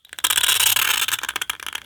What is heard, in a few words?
aluminium scratch